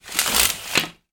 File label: household window blind venetian wooden drop; sound effects one